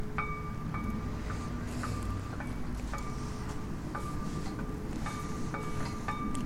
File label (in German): finger auf stange klopfen